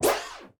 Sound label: sword swing